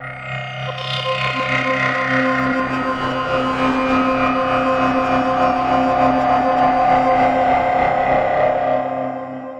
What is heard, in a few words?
Afraid, пэды